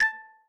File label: UI Clock Digital; SandBox Musics